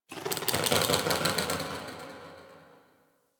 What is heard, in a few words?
Sentient Lair Exterior Creature; Warframe; Ambience